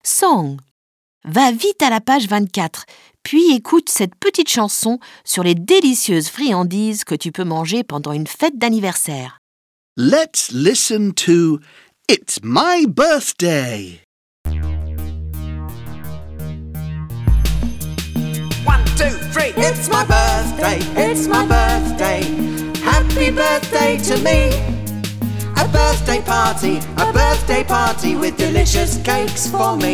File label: song The happy birthday song